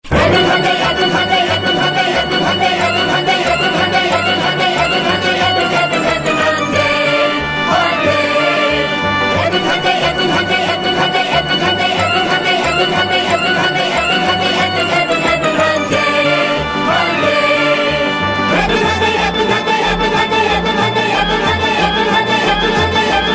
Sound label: Jingle; Edmond Hyundai